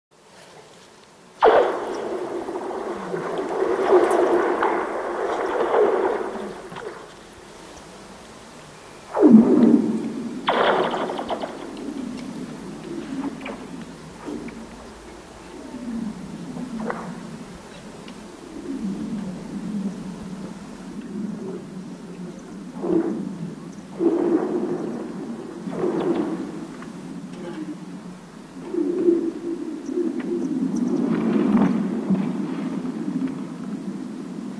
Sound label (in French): Bruits Etranges Lac Gelé